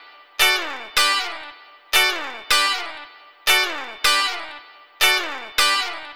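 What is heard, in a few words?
Flutes & Swingers Guitar Chicks D; Zero-G Kocktail Kollection; Construction Kitz (Lounge); Flutes & Swingers 156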